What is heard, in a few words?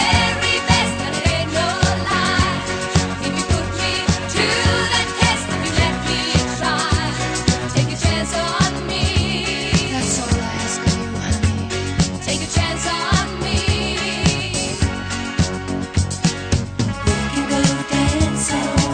disco